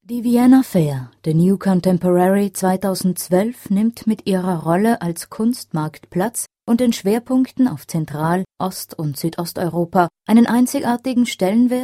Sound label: viola